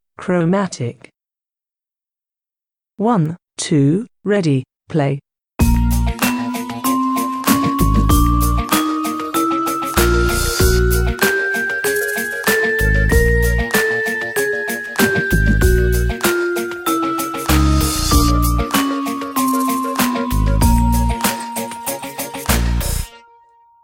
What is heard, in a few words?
chromatic b♭ to b♭